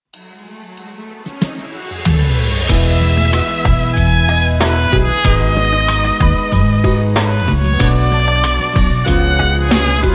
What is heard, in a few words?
beat, playback